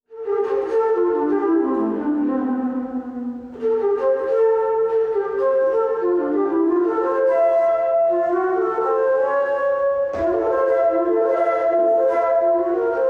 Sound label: Altoflute Improvisation